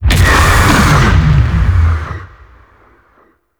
Tau Sentient Vessel Pain; Warframe

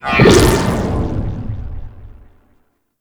Cloaking Ability Deactivate; Archwing

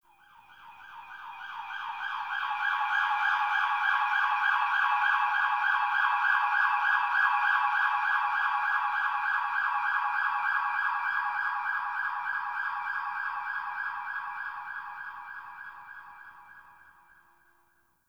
Exterior Distant Ambient Siren C; Warframe; Ambience; NineteenNinetyNine

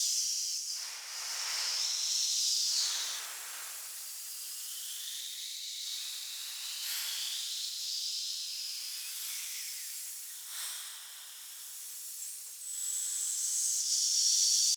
Corrupted Nest Fumes Loop; Encounters

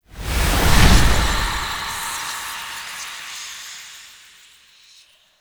Investigate Stinger; Jade

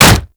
Kick Hit Default; Warframe; Melee

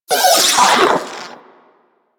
Vocal Death; Warframe; Corpus; Mini Moa

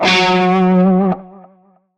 Shawzin Flourish Oct Two F Sharp; Music; Warframe; Narmer Shawzin

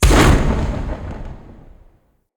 Siege Distant Explosion; Level Events; Midrath; Warsong Prologue

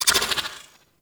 Sniper Zoom In; Warframe; Tenno

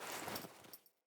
Foley Actions Default Wolf Jog Stop; Player

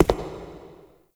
Shadow Figure Steps; Warframe; The New War; Characters; Zariman Shadow

